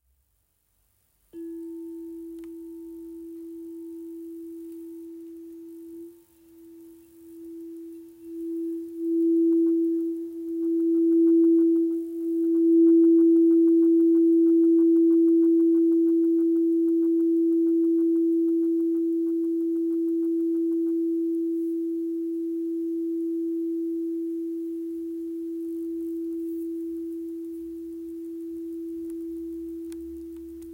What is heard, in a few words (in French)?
Bol cristal rainbow 7 pouces fa